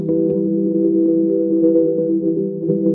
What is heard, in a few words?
notification